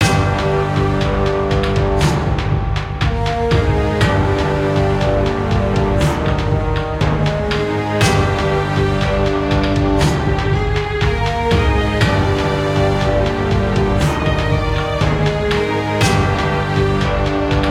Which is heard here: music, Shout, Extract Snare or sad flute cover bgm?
music